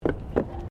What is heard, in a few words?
Tram Horse Drawn Step Wooden Bridge; Horse drawn tramcar; Carriage Sound Effects; Carriages (Sound Effects:Vehicles)